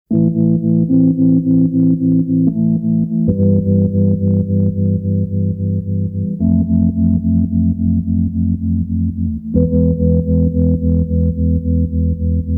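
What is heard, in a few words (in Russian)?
захватывающий фантастический объемный звук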